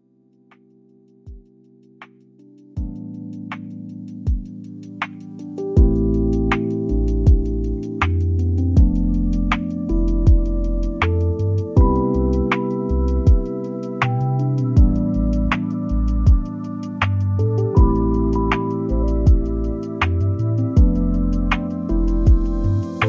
mellow urban night

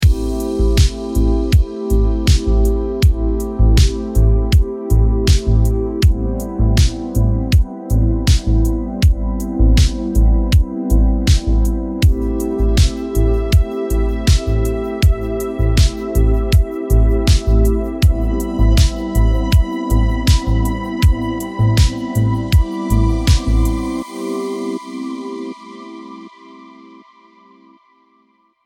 relaxed retro chill

